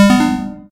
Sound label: Bass dot